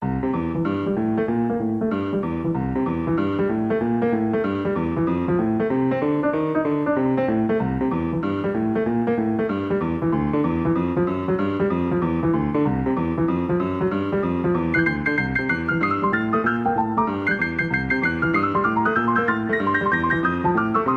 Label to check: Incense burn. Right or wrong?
Wrong